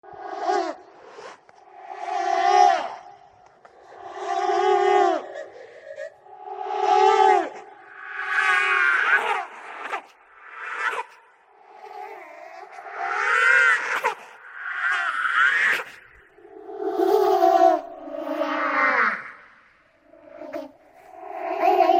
possessed ghost baby sounds creepy crying and laughing